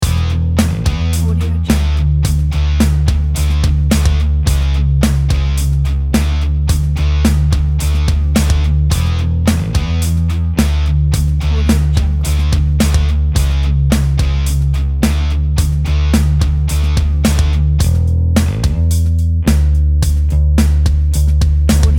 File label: hard rocking background loop